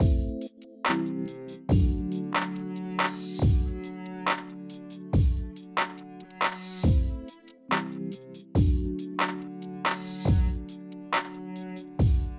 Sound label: Mystic Chamber Loop